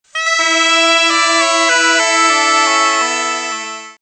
Zampogna

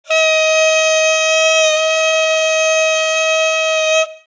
Hichiriki

